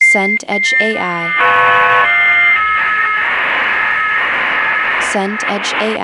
modem dialup

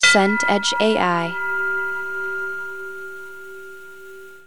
bell tubular

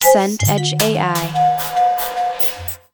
warp jump

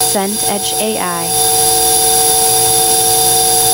scifi life support

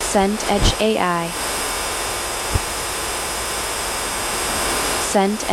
fire torch handheld

